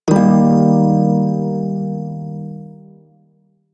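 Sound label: Prime Shawzin Minor Chord C; Music; Warframe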